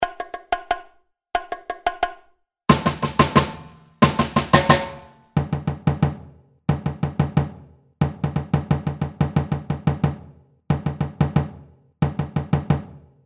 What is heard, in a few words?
samba reggae ensemble